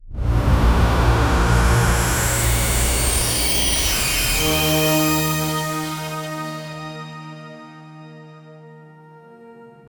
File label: opening sound effect futu, startup